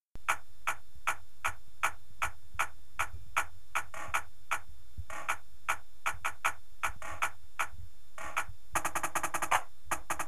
snare, drumline

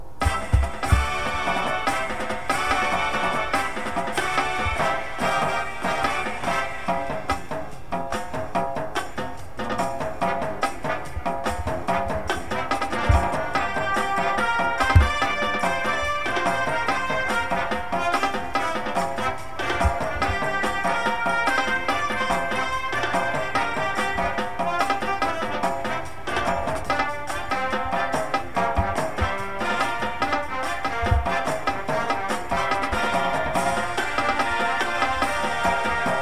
tenors with music, drumline

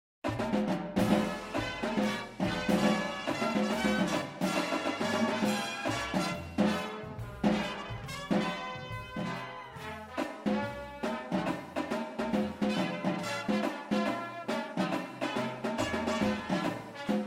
tenors with music

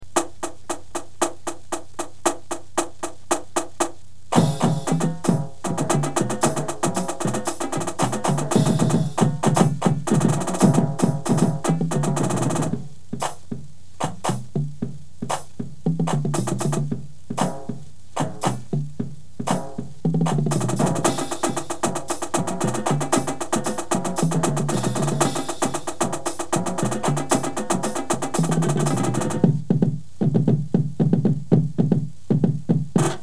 cadence, drumline

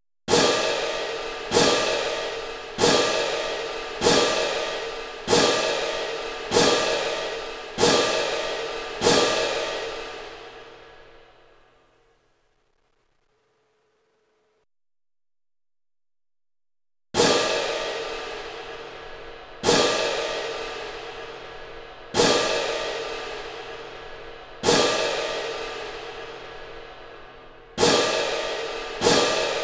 cymbals, drumline

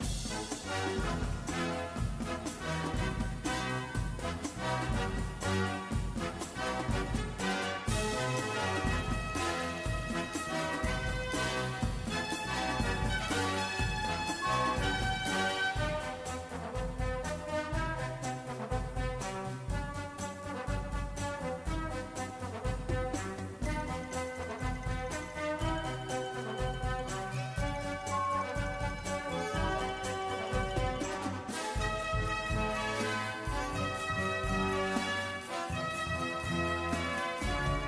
cymbals with music, drumline

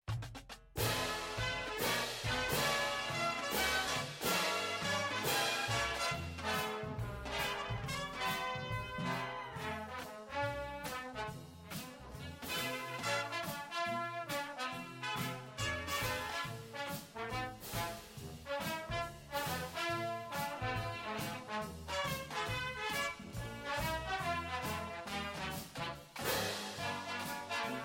cymbals with music, drumline

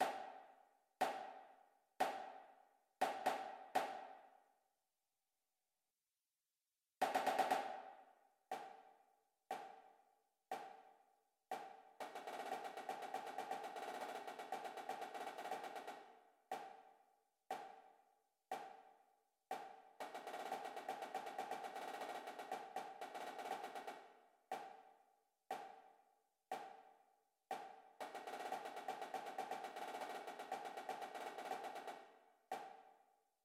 snare

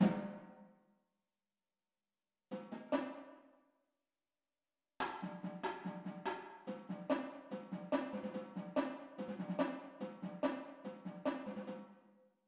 tenors

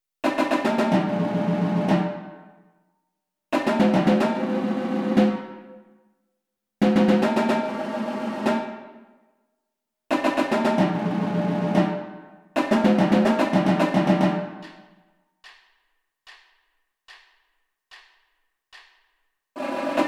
tenors, drumline

